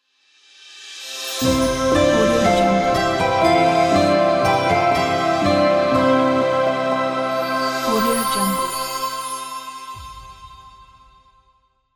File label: dreamy magic intro